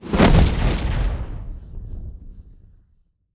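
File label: magic destruction cloak fire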